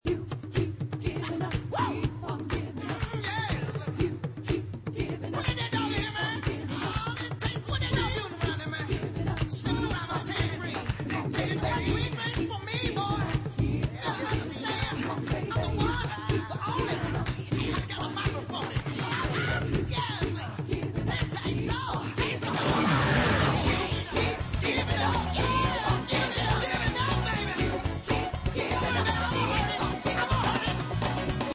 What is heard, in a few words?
122bpm